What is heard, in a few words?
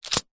foley unopened greetings card in cellophane wrapper set down; zapsplat